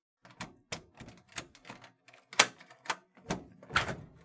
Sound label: locker; 鍵を開けて扉を開ける音